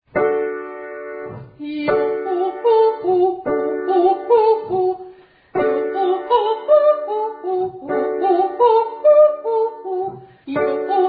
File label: Triads Octaves Yoh